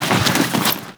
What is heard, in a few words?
Roll Forward Foley; Warframe; Characters; Tenno